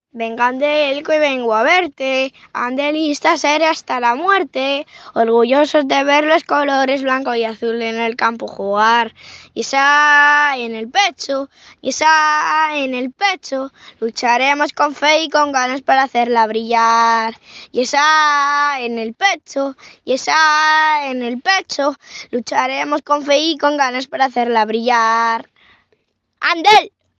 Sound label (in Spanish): Himno Musica; Himno Andel